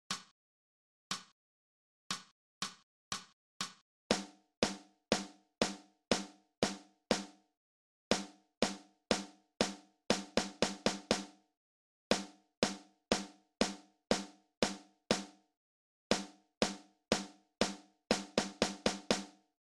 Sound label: Snare